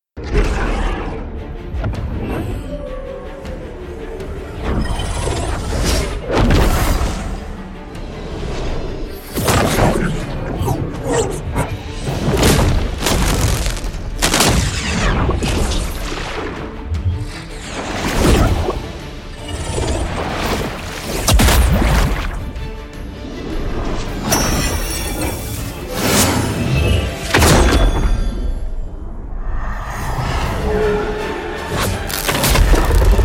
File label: magic arcane forces; boom